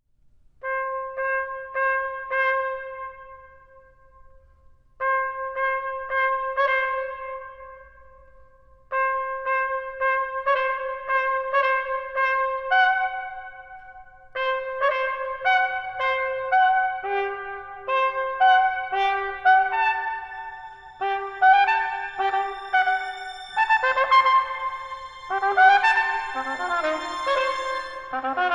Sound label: Concerto for Trumpet and String Orchestra; Mvt. 1. Nobleness made simple as fire